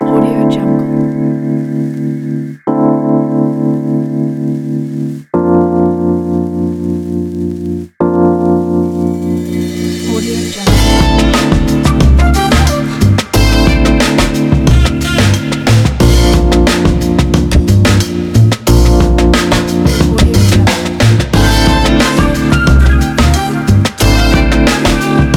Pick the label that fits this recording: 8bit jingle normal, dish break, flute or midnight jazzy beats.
midnight jazzy beats